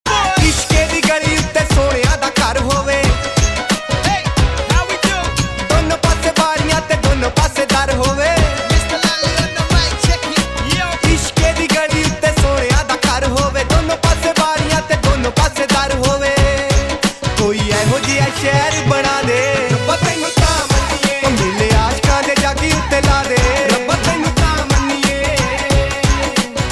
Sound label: Mobile tone